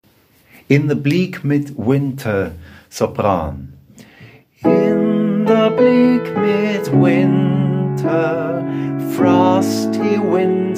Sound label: In the bleak midwinter Sopran